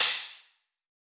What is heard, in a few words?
soft hitfinish; 『Chip 1.1』; Neuro